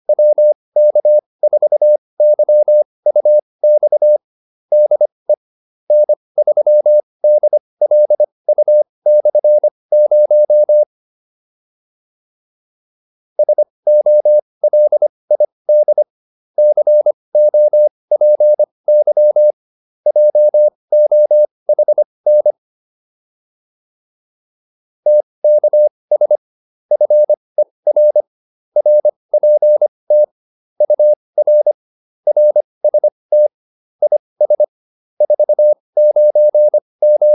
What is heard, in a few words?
QSO, intermediate practice files